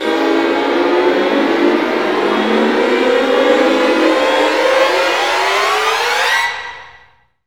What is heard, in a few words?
STRING GLISS; Roland - LA Composer vol. 1